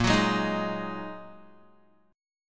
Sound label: Bb 7th Suspended 4th Sharp 5th, Guitar; Bb7sus4#5 strummed; 6,9,6,8,8,x